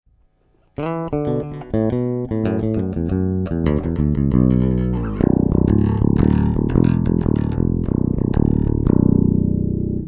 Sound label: bridge both neck, Russtone R5